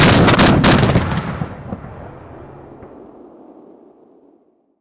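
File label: infantry fighting vehicle burst; ifv burst; SuperPower 2 Redux V7A; unit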